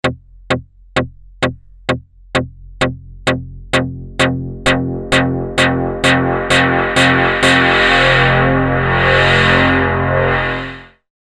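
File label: PPG 48kHz Single Sample velocity to VCF; EIII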